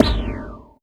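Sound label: bass drum, left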